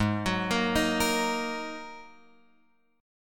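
A flat 7th Suspended 2nd A flat Guitar Open F, chord; Ab7sus2; arpeggiated; chordshape; x,3,6,5,6,5; JGuitar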